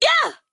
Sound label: attack; Heles (Summer)